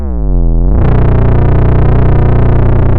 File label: pop, chainsaw